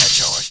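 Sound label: open hi-hat hip hop; DRMKIT; E-Lab; X Static Goldmine 2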